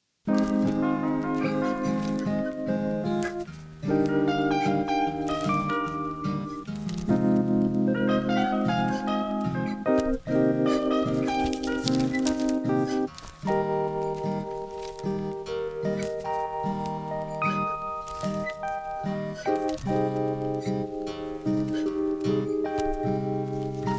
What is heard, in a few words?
Lofi